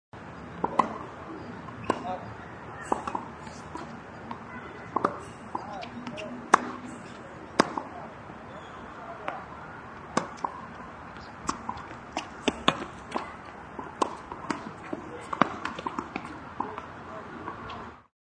Tnns Vlly, Tennis volley with ambient noise at park.; Sports